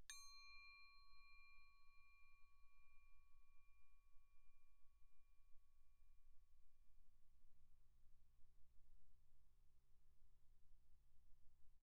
crotale Db6 pp; Percussion; crotales

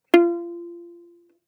Violin pizz ff sul D F4; MIS Pitches - 2014; Strings

